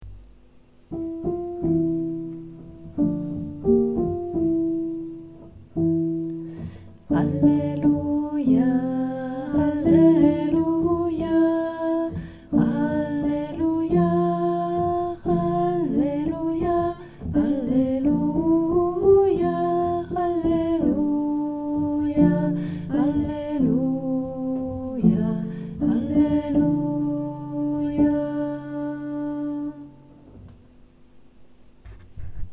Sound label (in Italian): Alleluia Lode Cosmica, contralti